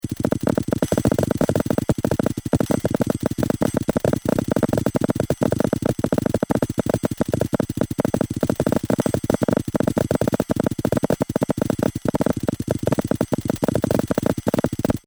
DD10 stream; drumcomputer; Randomstream 2

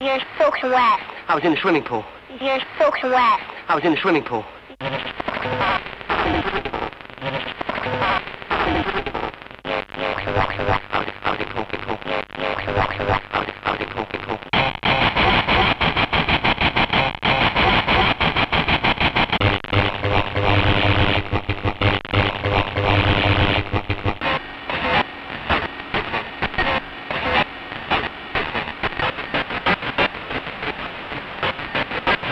VSS bending; bendexamples

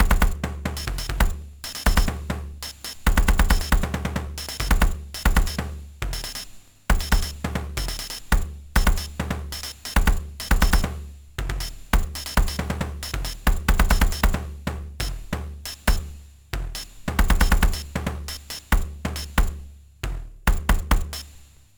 Mashed up Beat Pattern 2, Tr 505 bend

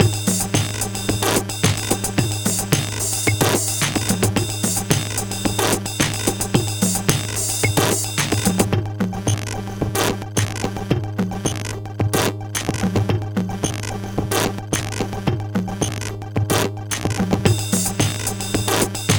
psr 37 beat bend; bendexamples

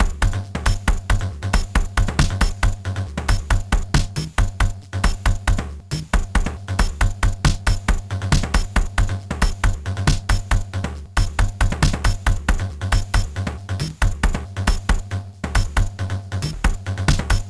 Mashed up Beat Pattern 1, Tr 505 bend

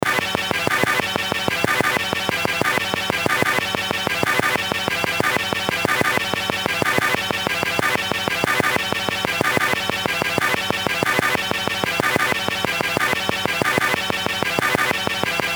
wersi

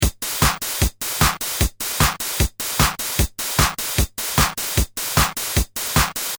505 distored, Rhythm Pattern Distor; Rhythm Pattern Distortion

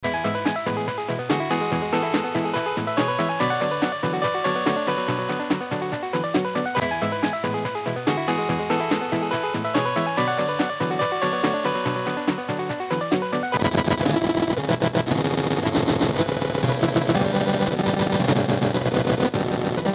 wersi bending

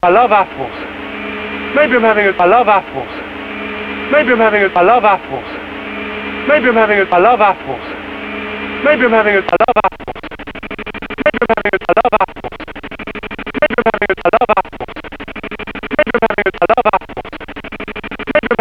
VSS bending; bendexamples

